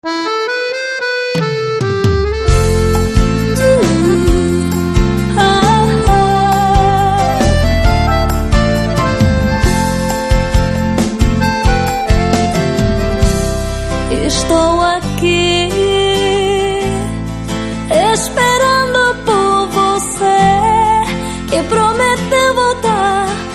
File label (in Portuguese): acustico